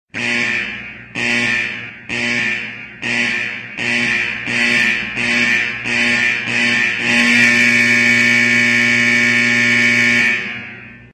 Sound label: Warning Alert Msg